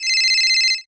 ringing

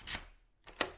houses door unlock

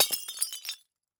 breaking vitrine glass

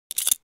Uncuff

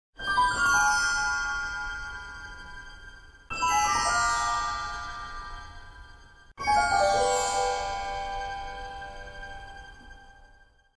speed alert

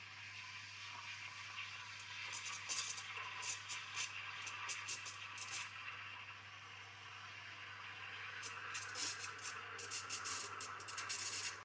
coffee machine

